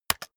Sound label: keyboard external space hit fast